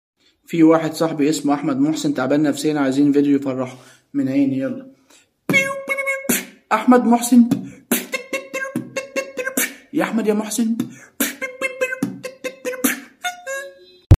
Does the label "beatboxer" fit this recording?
Yes